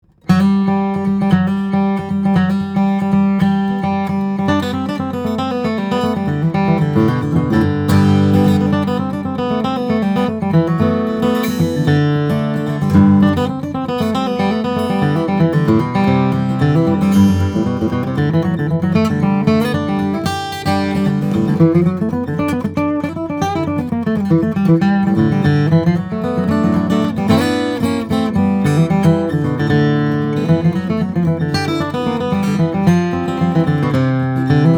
Martin D-28 Custom Authentic, steel string guitars, flattop; indian rosewood adirondack spruce